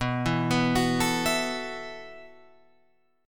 B 7th Suspended 4th B down Guitar Open F; B7sus4; arpeggiated; JGuitar

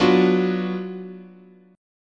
Gb Major 7th Suspended 4th Sharp 5th, chord

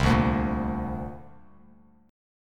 C 7th Suspended 2nd, C, down, Bass Standard; strummed; chord; C7sus2

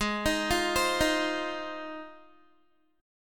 G sharp 6th G sharp down Guitar, chord; G#6; arpeggiated

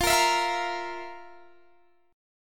F Major 7th Suspended 4th, Guitar, Open D; x,20,20,22,20,20; down; chord shape

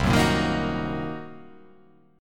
C Minor 7th Flat 5th, chord

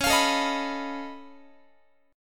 Db Augmented 9th, down, Guitar; strummed; JGuitar

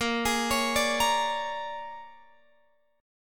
A sharp 7th Sharp 9th, Guitar, Open F; A#7#9; arpeggiated; x,17,20,20,17,17

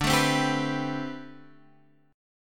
A-Minor 9th D; Am9/D strummed; JGuitar

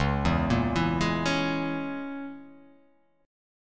Db Minor 6th, Dbm6 chord; Dbm6 arpeggiated; x,4,2,3,4,3,3,x; JGuitar

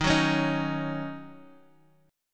D sharp Minor 7th, Guitar, Half Step Down

